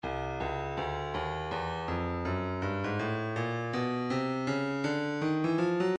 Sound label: breakbeat midi 081 bpm; Neck C; Stylus Breakbeat Midi Files